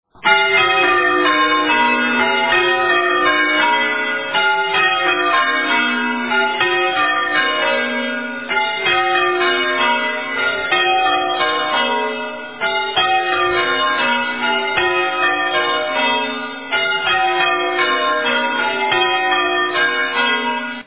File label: Bilbrough Bells